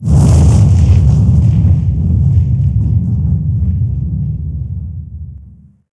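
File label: combat neb fire attack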